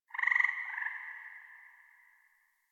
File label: Forest Distant Frog Call Resp; Warframe; Grineer Forest